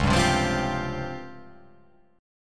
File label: C sharp Major 7th Flat 5th, chord